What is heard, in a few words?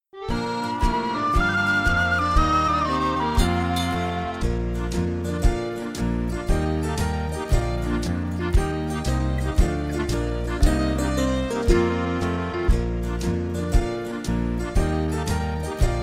pirate karaoke